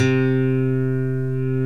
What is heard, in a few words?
6 STRING GTR; OMI - Universe of Sounds